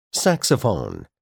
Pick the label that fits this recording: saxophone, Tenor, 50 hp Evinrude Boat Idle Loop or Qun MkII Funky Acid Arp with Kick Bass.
saxophone